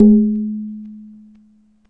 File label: coke ring pull; Weird and Interesting Hits; single hits